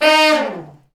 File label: BRS SH FALL, Brass Sections, BRS_Fat Falls; Roland LCDP06